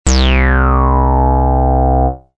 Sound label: Sweep bass